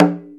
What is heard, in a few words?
Conga Low Mute; GMPercussion; 3DO; Toolkit 1.5; GMPercussion44k